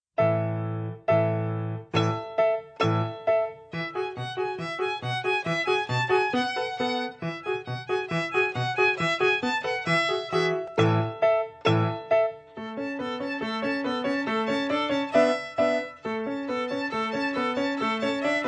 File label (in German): Stiefeltanz